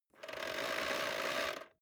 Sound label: vacuum cable pull in slow